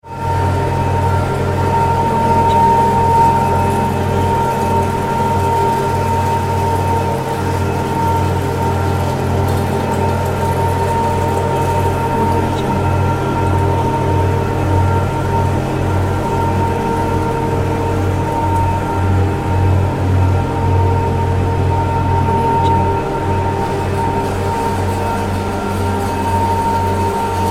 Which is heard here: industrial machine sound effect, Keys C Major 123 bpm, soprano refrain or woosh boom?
industrial machine sound effect